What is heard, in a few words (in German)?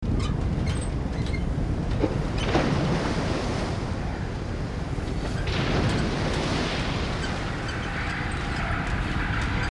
sturm regen schild quietscht